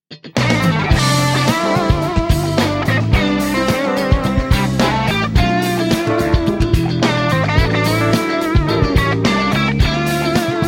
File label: Gospel Celebration Choir